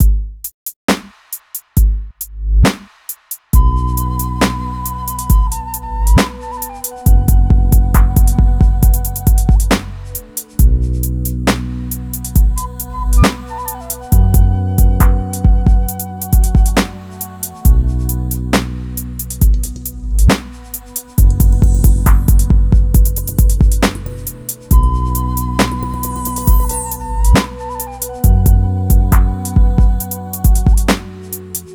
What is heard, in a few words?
068 Bmin, Hip Hop Exotica; Apple Loops; Big Fish Audio